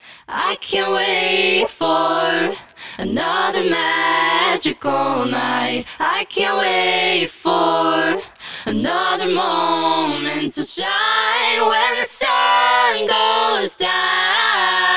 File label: Harmony Pitch Variation